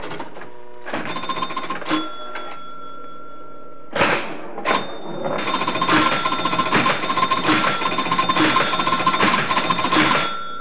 Pinball machine

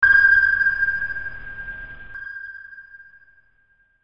submarine sonar

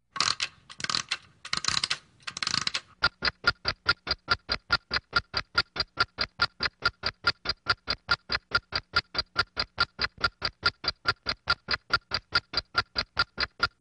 Scary Sound - Time Bomb Ticking

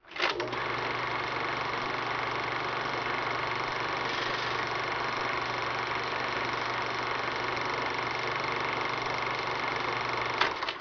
movie projector

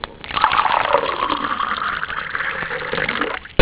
liquid pouring

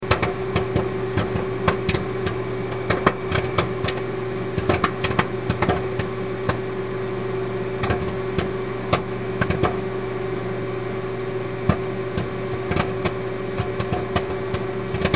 Popcorn Popping; KevanGC

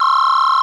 Cellphone

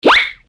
shwink; youtube

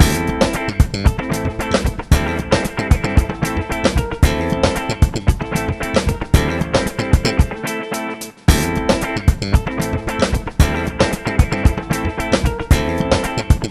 party funk

